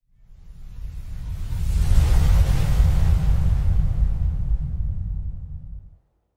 Fog Disperse

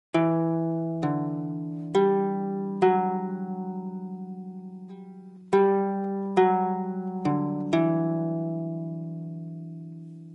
bard kitara; assassin s creed valhalla

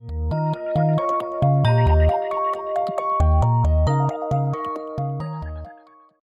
Bamboo Grove BGM; uno

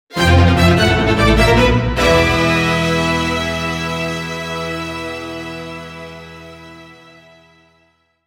Stage Complete (Orchestra Version); neon inferno; Exclusive for Stage 3 Complete

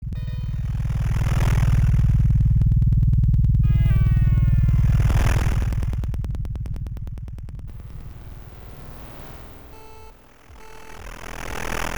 Noise 1 (Station Front); Power Slave; PC-98

